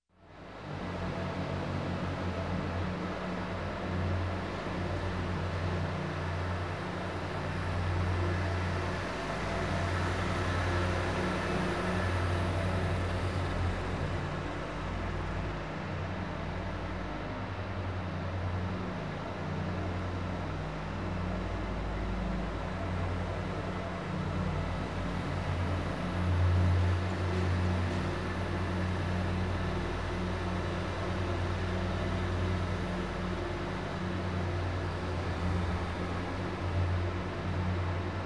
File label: zamboni